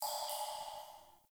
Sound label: Cave Ocean Drip; Ambience; Midrath Island